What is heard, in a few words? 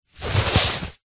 clothes shorts sports nylon childs movement slide on; foley